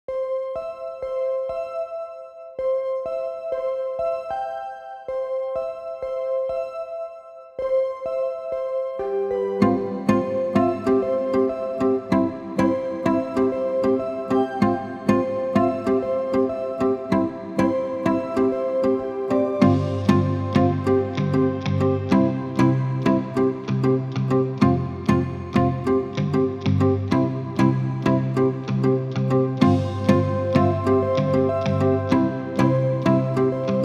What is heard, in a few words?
Island Hopping, mellow island beats; Narrative Mind